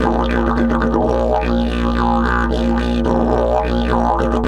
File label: 108, DIDGE 108BPM